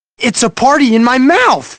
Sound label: mouth party